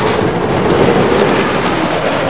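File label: RLLRCSTR, roller coaster; TRANSPOR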